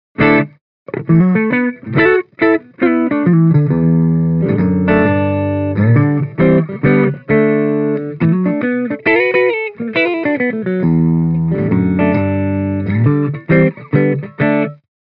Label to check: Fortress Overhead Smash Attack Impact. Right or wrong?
Wrong